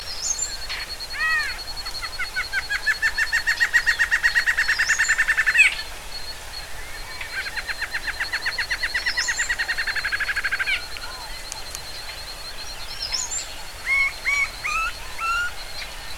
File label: doliatus; costa rica 2013